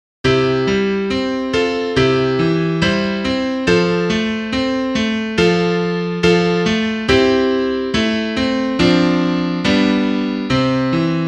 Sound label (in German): Klavier; Liederbuch